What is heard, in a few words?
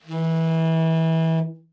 tenor soft, sax; Giga Samples Collection